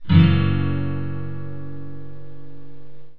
f# 7 chord, guitar

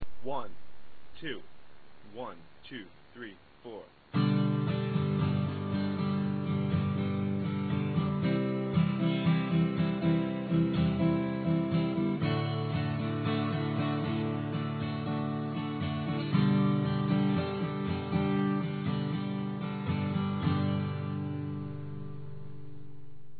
strum, guitar acoustic

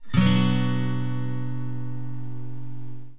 bm chord, guitar

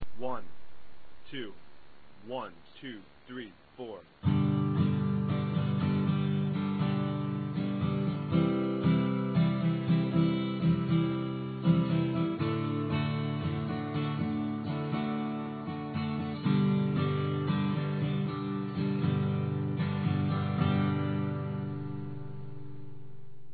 strum, guitar acoustic

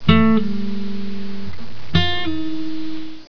pull, guitar

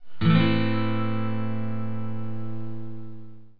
am chord, guitar

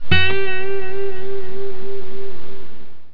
vibrato, guitar

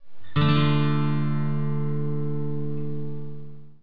dm chord, guitar

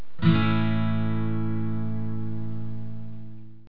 a chord, guitar

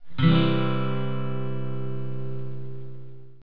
c chord, guitar

